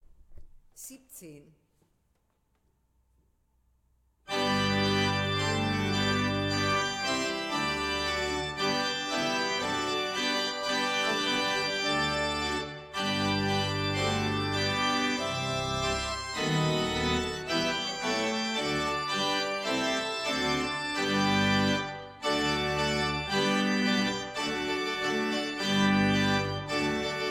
Weigle, gebrauchtorgel

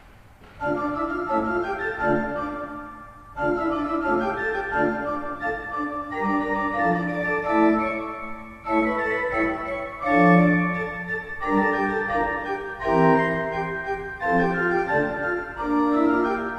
Fleiter 19 II P, orgel

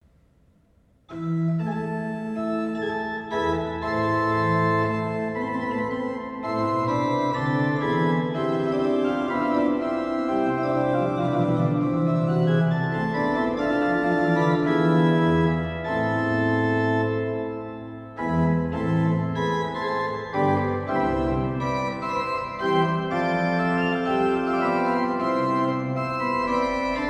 Nagels 4 I, pfeifenorgeln

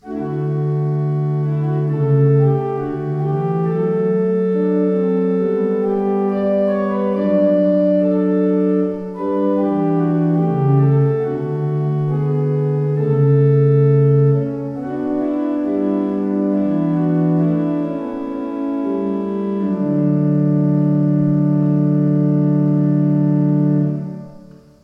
Walcker, gebrauchtorgel

